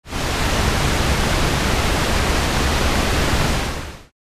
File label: Synthex chorus